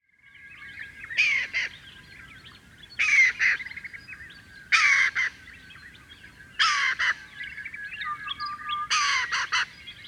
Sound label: Falco berigora, roofvogels; Bruinevalk; Falconidae; Falconae